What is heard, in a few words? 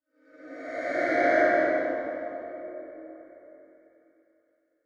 Crystal Whoosh Rear To Front; Crystal Feedback Whoosh Rear To Front